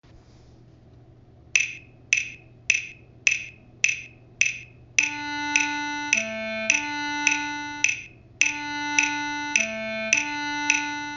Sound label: clarinet; harry potter